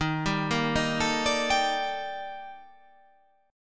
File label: D sharp Major Sharp 11th D sharp, chord; chordshape